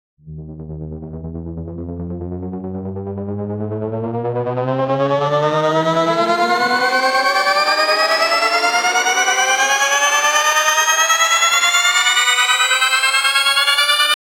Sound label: music tension raising syn, synthesizer